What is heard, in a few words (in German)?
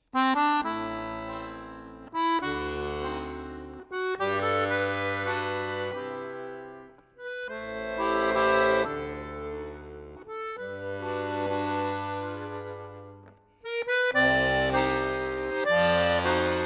Jazz Ballade, solo akkordeon